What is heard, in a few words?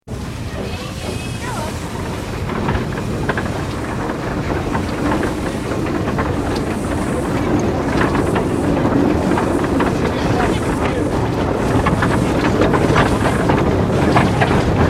Steam Train Narrow gauge Railway, On board travel; On board travel with children - whistles - starts and stops; Train Sound Effects